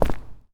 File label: Personnel Footsteps Concrete; DCS